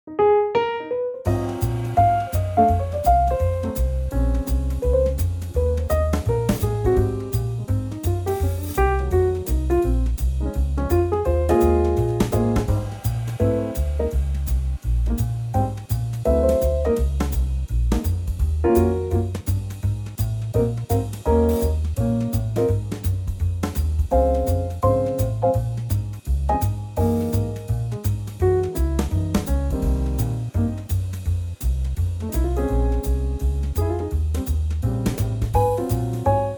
Ab swing

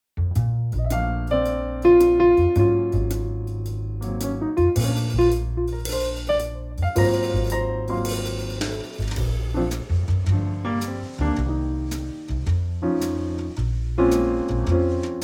Bb Jazzville

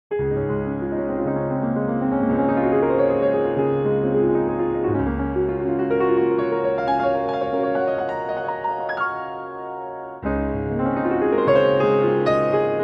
Db piano

